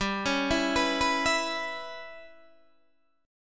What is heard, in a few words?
G 6th Flat 5th, Guitar, DADGAD